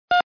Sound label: ekg